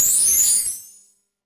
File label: end report open